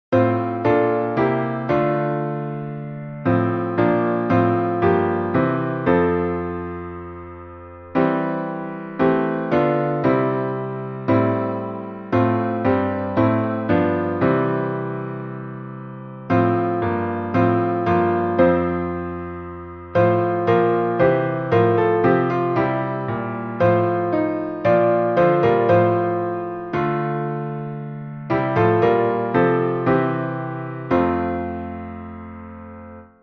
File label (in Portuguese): salmo instrumental